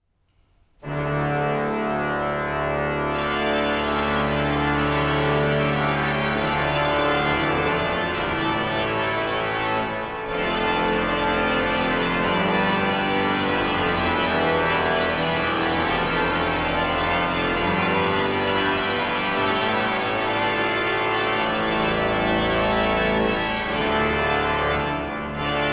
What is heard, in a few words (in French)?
IMPRO grand jeu